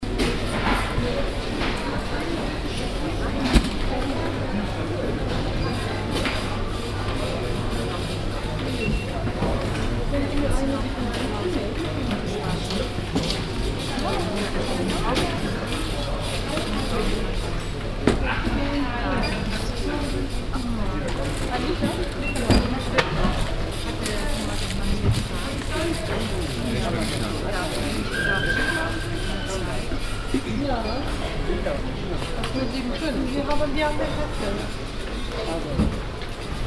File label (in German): baumarkt kasse